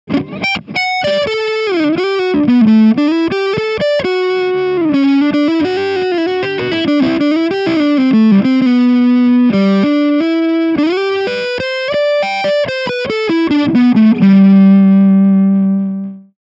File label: Leadsound Front